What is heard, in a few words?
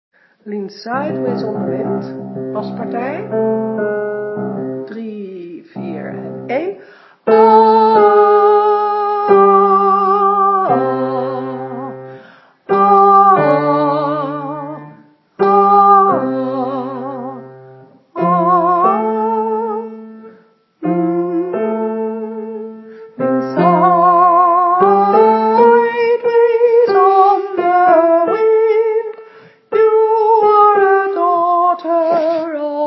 bas